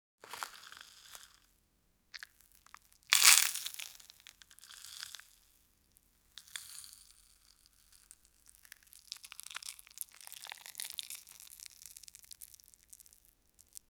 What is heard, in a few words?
crispy tofu squeeze, crunch